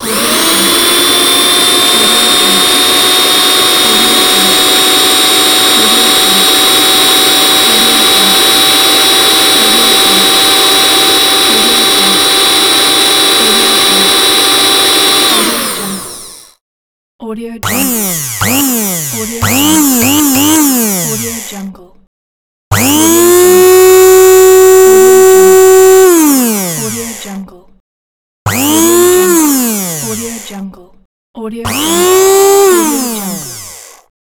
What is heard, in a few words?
hand blender motors